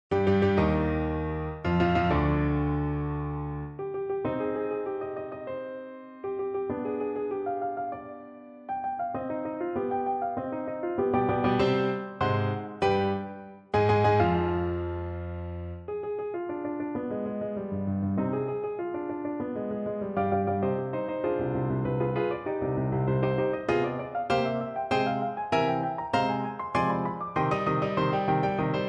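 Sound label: symphony 5 1st movement piano; beethoven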